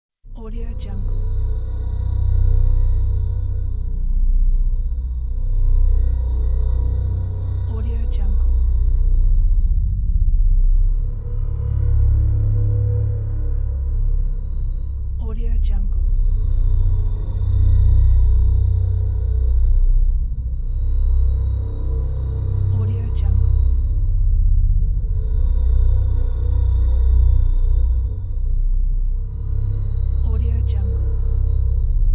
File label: dark ambience